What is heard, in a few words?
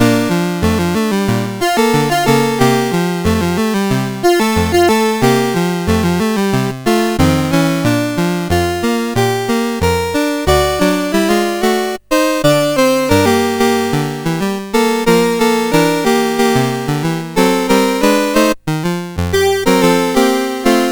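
MOS 8580 SID chip; CSG8580R5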